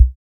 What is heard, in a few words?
Checkmate BD, Keio Checkmate